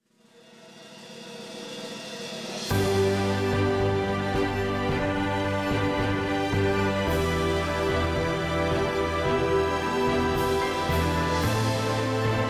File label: song